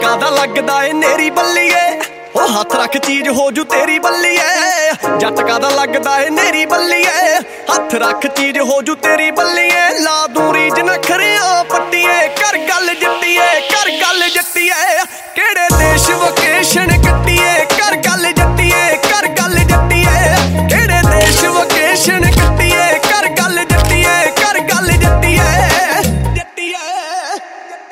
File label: punjabi ringtone